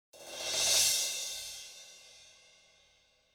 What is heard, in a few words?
Cymbal